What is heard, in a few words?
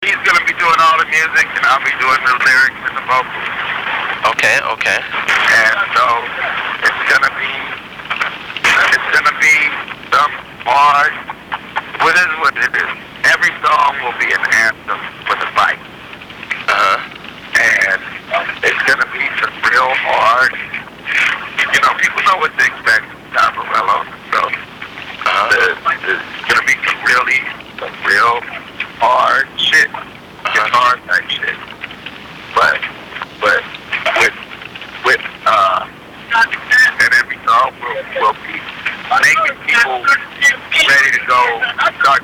street sweepers; tacomarockcity